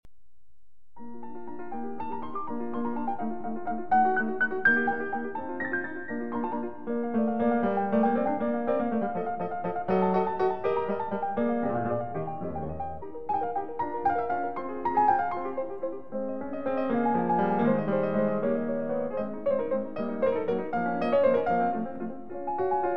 Piano Sonata No. 21 in B-Flat Major; D. 960; Schubert

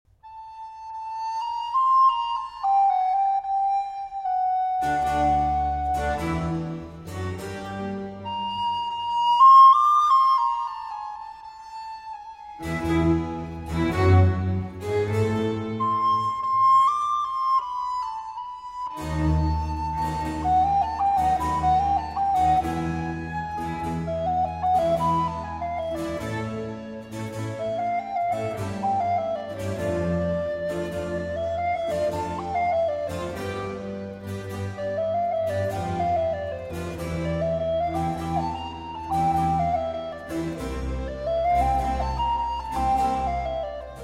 Concerto for Flute and Strings in F

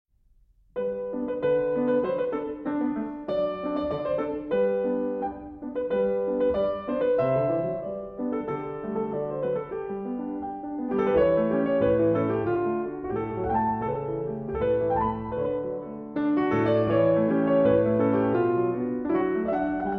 Variations on a Melody in G Major

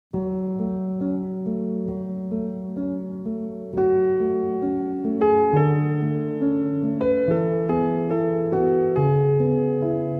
Pavane in F-Sharp Minor, Piano Version; Op. 50

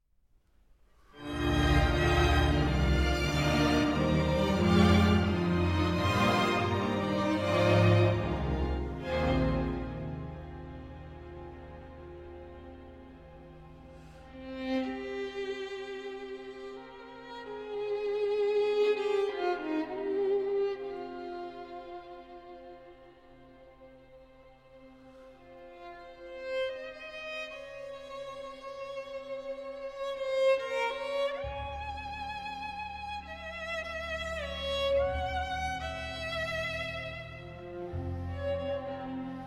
Lament For Solo Violin and String Orchestra; Turnage

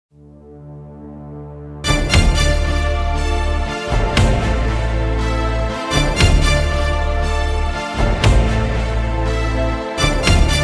Instrumental

